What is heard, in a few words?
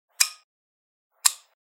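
lamp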